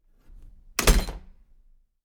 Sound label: doors metal door interior thick metal door solid; domestic sounds